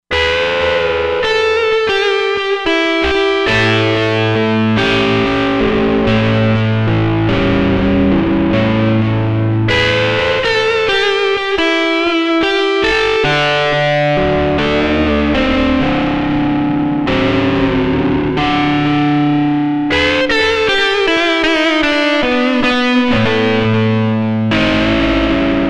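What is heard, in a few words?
Guitar Blue Lead, synth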